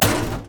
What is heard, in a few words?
Drone; Rabbids Multiverse